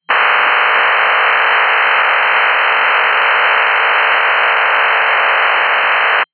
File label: qam 16